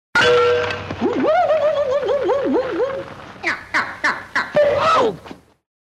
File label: woo woo woo